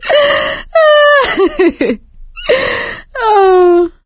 laughter, survivor, voice

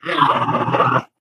ballbot awww

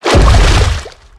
tank walk water, footsteps; player; source engine; left 4 dead 2

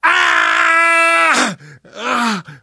hurt critical, survivor voice

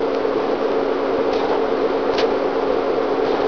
projector, ambient tones

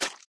flesh, footsteps run; source engine; left 4 dead 2; survivor

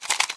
m249 cover up, weapons; Source Engine; cstrike

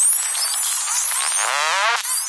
servo chatter, scanner; video game; npc; Source Engine

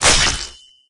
cleaver hit; tf

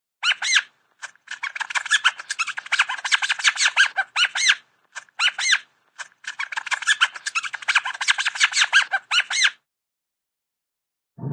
rat rat squeaks, animal, rodent; cartoon

